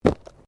Body movement Fall Medium

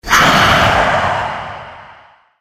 Terror Beast Snarl Of Horror, monster

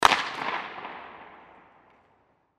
Hockey Slap Shot, Hockey Slap Shot Puck; Sports; Blastwave

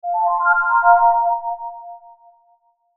Logo Soft Twinkle Melody; Sonic Logo; Blastwave

